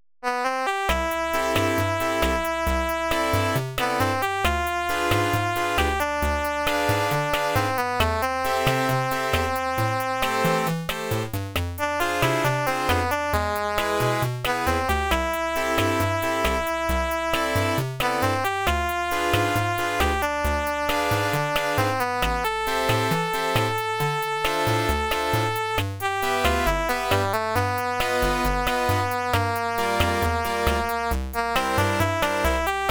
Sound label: Bossa